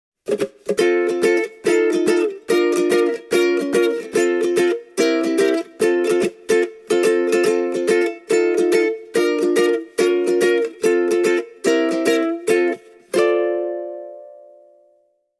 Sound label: solo, ukulele; baton rouge v4 c sun